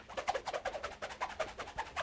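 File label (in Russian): несколько взмахов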